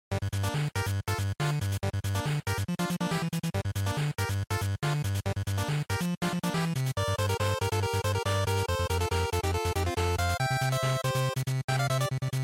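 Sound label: Game Boy Action Stage, bgm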